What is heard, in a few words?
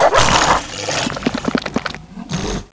monsters pain; oblivion darkangels